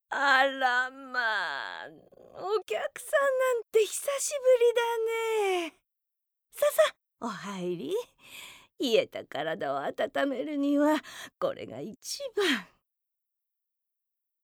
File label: lastboss